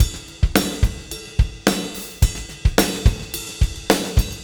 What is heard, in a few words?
PULL RIDE, Backbeat; Spectrasonics